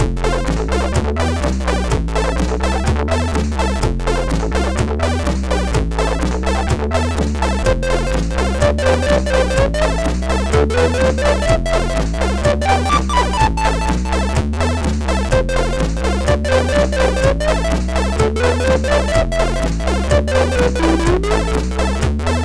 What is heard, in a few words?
sid, MOS6581R4; A-Man